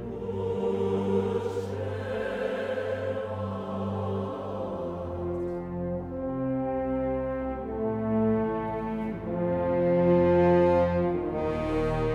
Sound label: Bass Mono